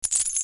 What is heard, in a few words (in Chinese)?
金蛋中奖; goldegg